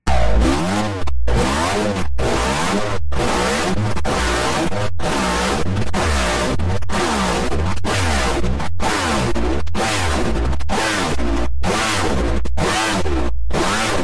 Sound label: b 40 room s low detailed